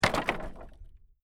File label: Wood Drop On Wood, Wood rattle as plank of wood falls on other planks.; LYN100 package of Stone & Wood sounds; Wood Sound Effects; LynneMusic